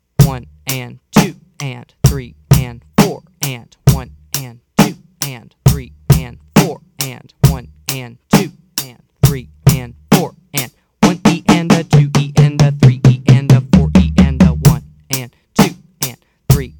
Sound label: Beat 2 With Fill Slow